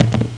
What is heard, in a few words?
Chip on Felt; iPoker 2000